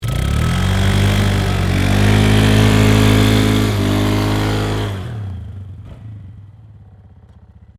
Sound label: ATV Stuck Snow, Sports, Atv, Stuck, In, Snow, Polaris, 500Cc, Four, Wheeler